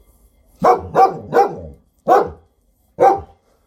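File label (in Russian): лай собаки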